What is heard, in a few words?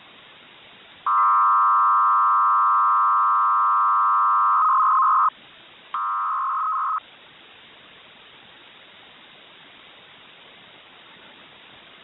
AUTOLINK-I 1st. GENERATION ALE SYSTEM; HARRIS PROPRIETARY "AUTOLINK-I"